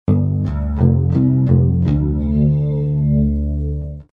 Bass Amb G,F,D 120

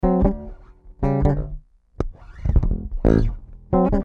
C 92 Funk

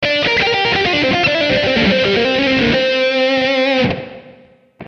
98 blues lead

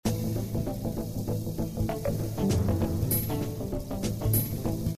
Risky Playfull Funk 98 Am7

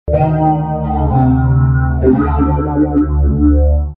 Bass Amb D 125

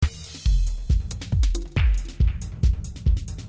Quieter Jazzy Moods

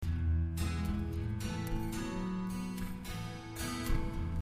Plucking E,A,B,A

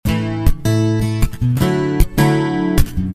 Punchy A,D

